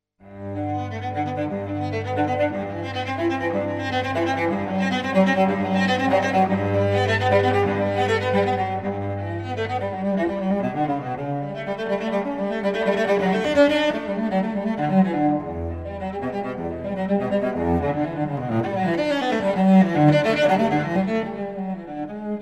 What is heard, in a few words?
Cello Suite No. 1 In G Major, Bwv 1007 I. Prélude; Bach